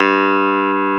Clavinet G2; USB Soundscan vol.09; AKAI; Keyboards Old School; CLAVINET5

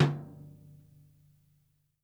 DEAD HIGH, TOMS; SW TOMS

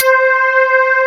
wet bass

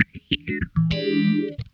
SOFT FAZE, Funk Guitar; 90 s Sample CDs; Zero G

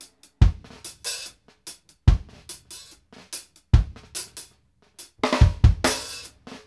KICK, REGGAE 72; Sampleheads; New York City Drumworks VOL-1

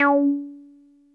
303 C4, TB 303, Synthesizer; Hollywood Edge; Giorgio Moroder Rare Synthesizer Collection

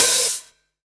Open HH, Cymbals

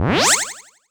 C Lazer Accent

